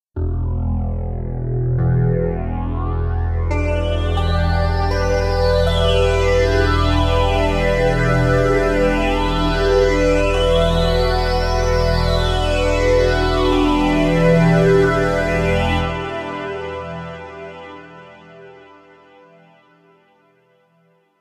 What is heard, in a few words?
String